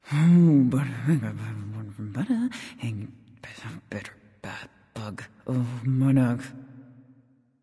grumble; smite; nox

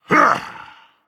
grunt attack, dragon; fafnir

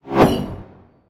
sickle swing; smite; osiris

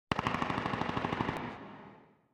landing gun auto; smite; Ares

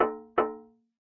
victory glass; smite; thanatos

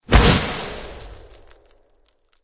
defeat reaction hit ground; smite; odin

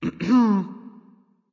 clearthroat; erlangshen

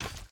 foot; smite; ahpuch skin10

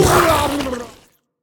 Banana Eat; smite; raijin

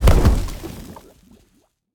defeat struggle bodyfall; smite; poseidon

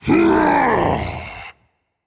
battlecry rage; chaac

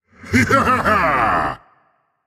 "yay"; smite; voicepack; set

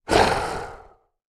hiss; Smite; Sobek

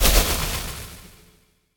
idle electricity explode; smite; god merlin

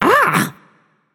surprised, voicepack; smite; jorm

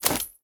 armor; smite; tiamat

